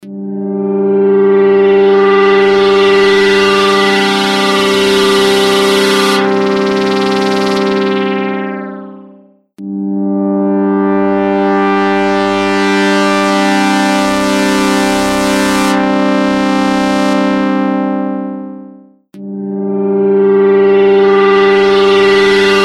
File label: instant flanger mk ii